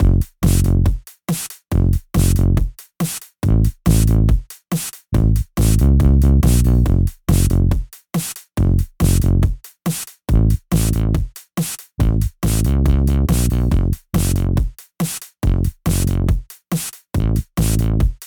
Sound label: Future Impact saw, bass